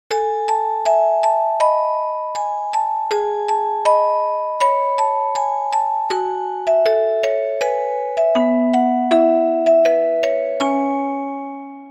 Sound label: musicbox